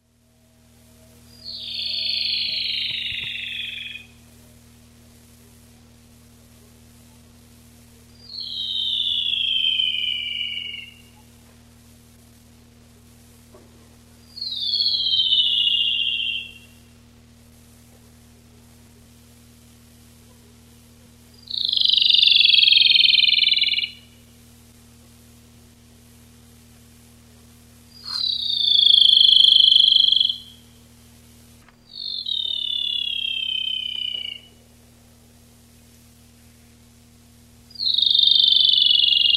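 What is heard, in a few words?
Lsibilans, Locustella lanceolata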